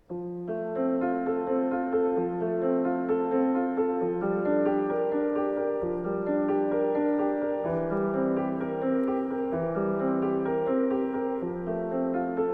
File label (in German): Ave Maria Bach Gounod